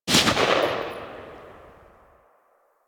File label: M16 Rifle Tail; GrineerM16Rifle; Warframe; Weapons